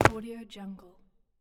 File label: warehouse concrete floor footstep; stormwaveaudio